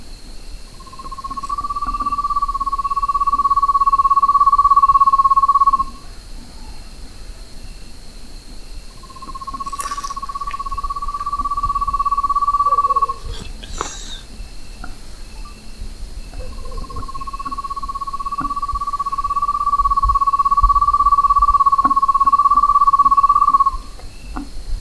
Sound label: Aegolius harrisii, Harris zaaguil; uilen; Strigidae